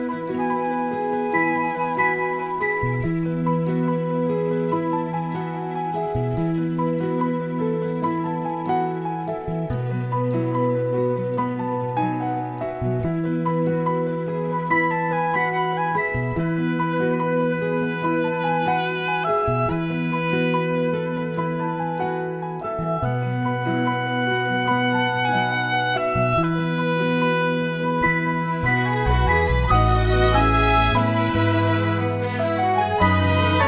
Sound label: instrumental